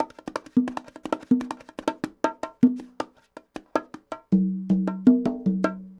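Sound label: congas bongos 80 bpm, latin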